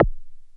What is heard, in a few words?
Perc Hit, Noise